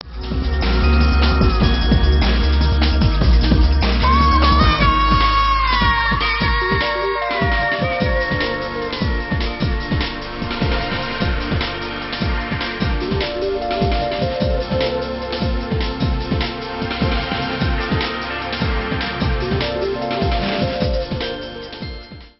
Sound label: jungle mix